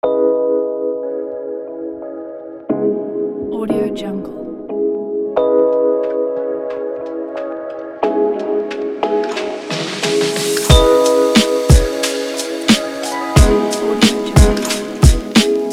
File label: chillout hip hop